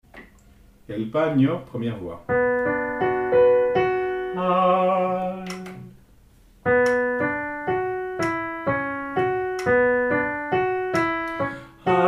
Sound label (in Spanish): El panio Soprano